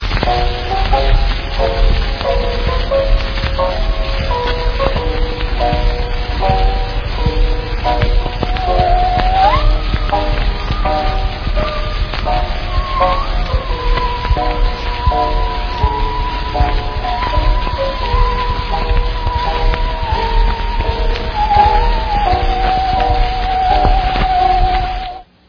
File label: saw